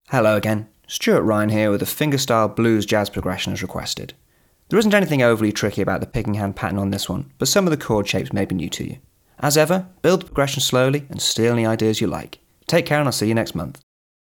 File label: Acoustic intro; Guitar Techniques 2019 Issue 294 Spring